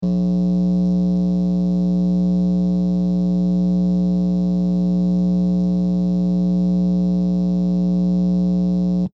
Electric Transformer Hum, Muted, muffled electricity buzz; Muted, muffled electricity buzz from an electricity transformer.; Electrical Sound Effects